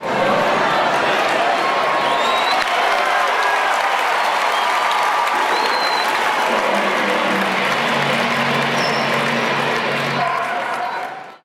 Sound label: Baseball Crowd Cheer; Crowds